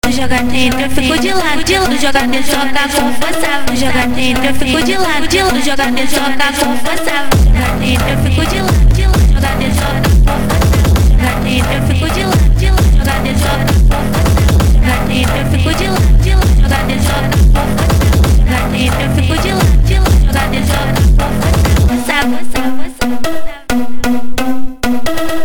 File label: phonk remix